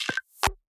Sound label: Task Notification